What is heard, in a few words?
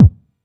Bassdrum